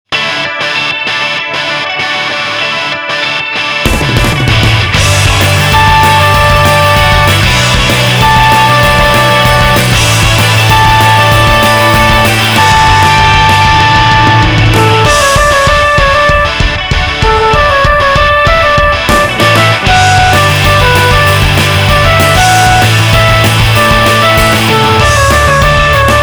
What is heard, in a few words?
Angel Beat Crush synth melody bpm193